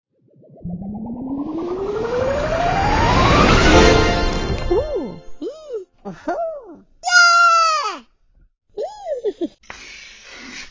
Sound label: Tom grows up